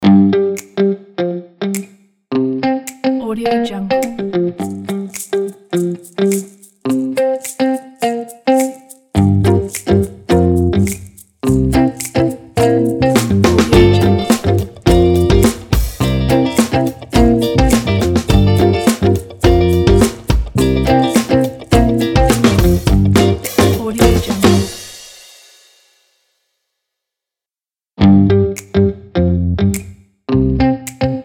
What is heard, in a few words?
cool funky intro